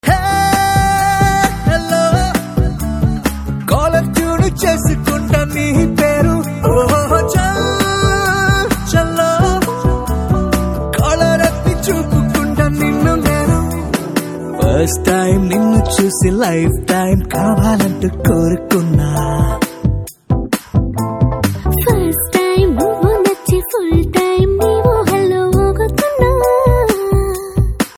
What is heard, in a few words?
Ringtone; Pantham Movie